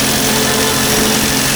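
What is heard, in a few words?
Laser Hit Exterior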